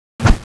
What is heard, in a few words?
knife attack jump